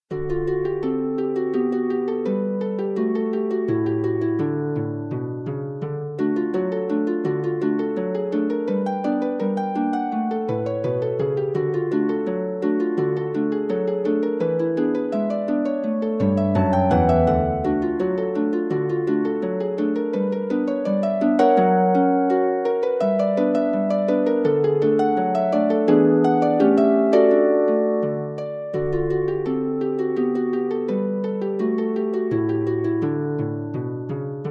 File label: Ragtime Chicken Pickin Rag